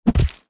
impacts body hit baseball bat hard whack crack squelchy bloody